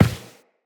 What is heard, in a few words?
Step Default, Footsteps Walk; Tenno